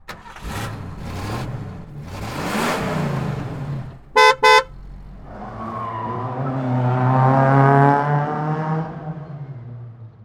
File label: Classic Cars Sound